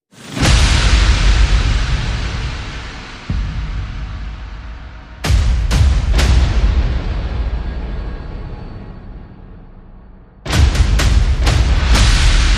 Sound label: Percussion and Effects